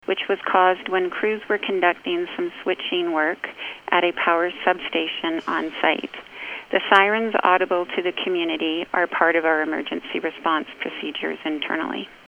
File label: SIRENS